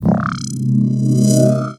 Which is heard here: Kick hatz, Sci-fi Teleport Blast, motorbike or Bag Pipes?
Sci-fi Teleport Blast